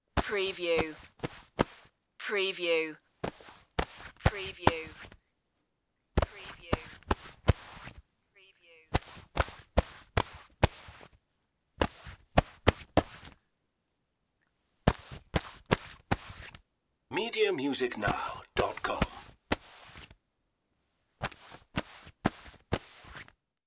clean hands on trousers

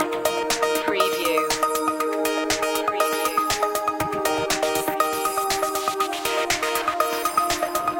radio waves loop

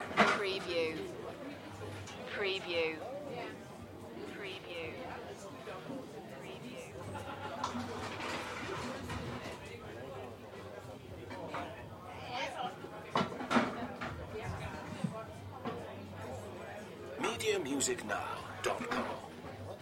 Europe Shop Close Up; Sound Effects Bible

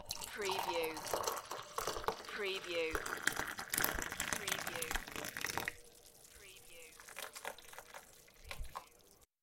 Tea pouring; beanstalk audio

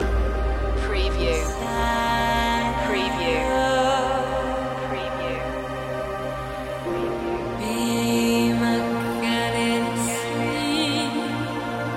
ethnic movements

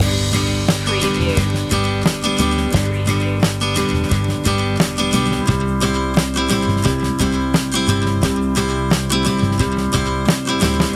tap your knees loop

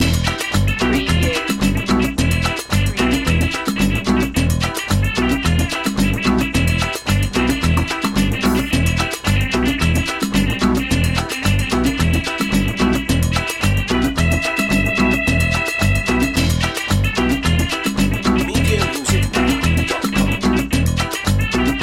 peruvian patrol loop

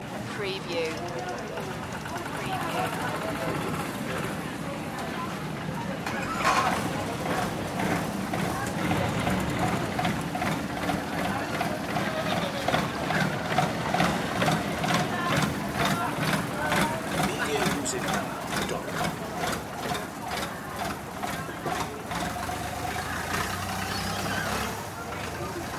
busy harbor ambience thailand loop

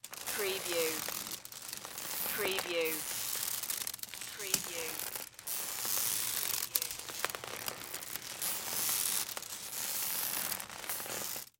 Wicker Chair Creak; Sound Effects Bible

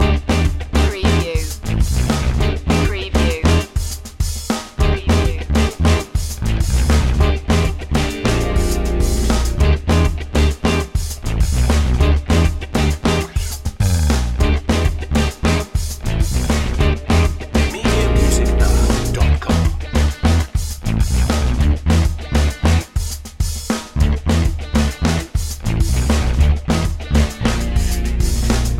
funk side loop

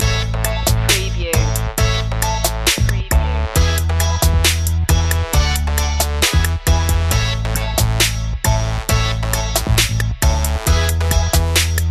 flying high loop

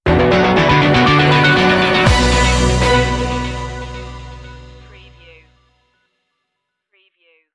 dark sky stinger

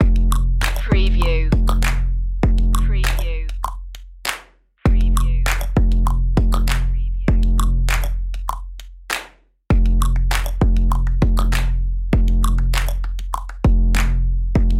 clap hop

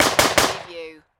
Submachine Gun FN P9; Sound Effects Bible

